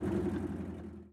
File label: engines; World of Tanks